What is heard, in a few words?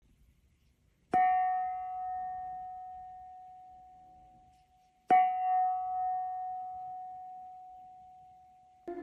Om Black, gong; serenity prana gong; 15cm